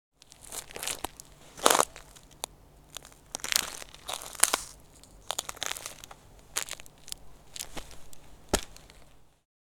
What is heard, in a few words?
Walking on frozen ice shoes crunchy cracks